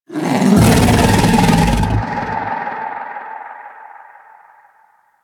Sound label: Vocal Wail, critter; Warframe; Hunting Critters; Undazoa